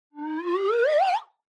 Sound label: Slide Whistle Phrase